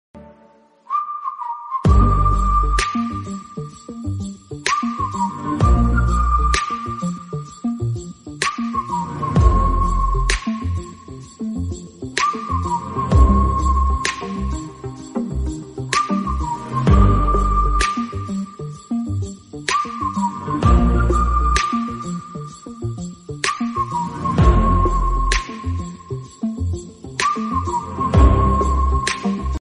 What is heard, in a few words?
whistle instrumental bgm ringtone